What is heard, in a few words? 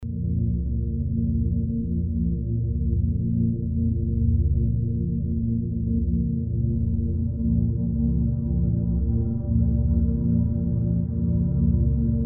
Warm, flowing synth, Ambient SoundScapes